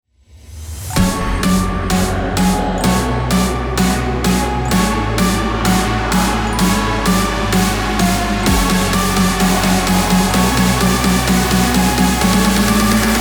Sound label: 128bpm key f#